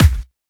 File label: Kick, Drum Hits